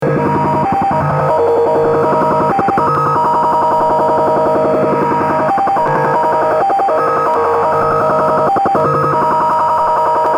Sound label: Japan Fisheries Modem; Japanese MFSK Datalink 6416.5kHz Also known as "The Slot Machine"